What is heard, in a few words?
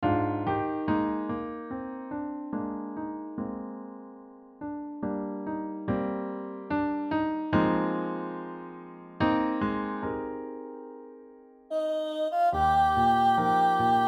The Lord Is My Shepherd SATB Piano soprano and piano